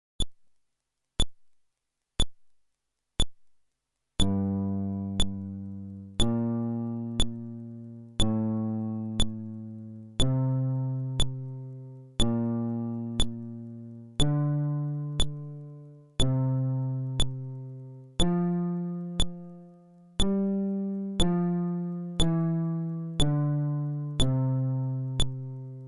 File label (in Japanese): フレットレス